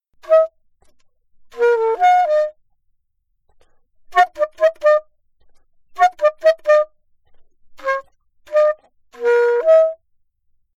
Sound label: altosax